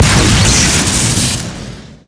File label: giant soldier rocket shoot crit; Garry's Mod; tf2; mvm